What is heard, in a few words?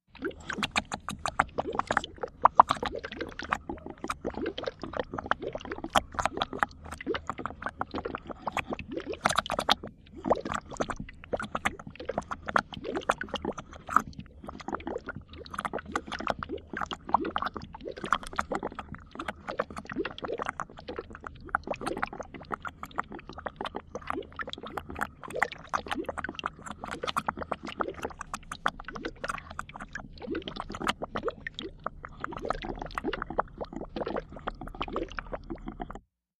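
Fast Viscous Bubbles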